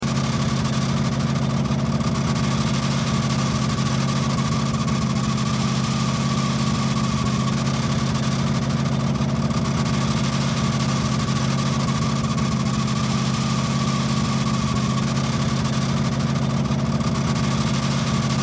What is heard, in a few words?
Car Squeaky Belt Run Loop Car